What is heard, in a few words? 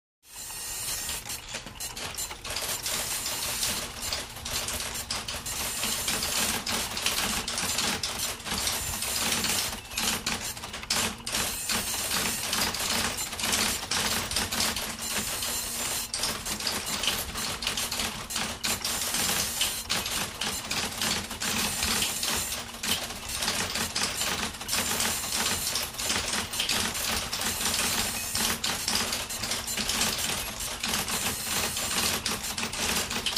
Computer Room With Fans And Hum